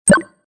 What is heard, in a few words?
click